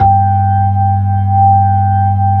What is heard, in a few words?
B-3, Hollow; Keyboards of The 60's and 70's